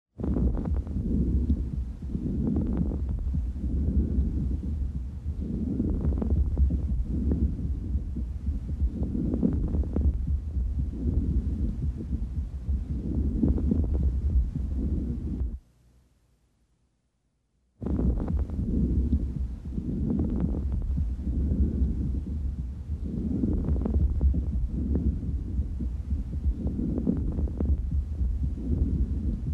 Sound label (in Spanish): Crepitantes finos con sonido bronqu; Crepitantes finos con sonido bronquial; lób dc